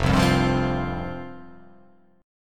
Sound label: F Suspended 2nd F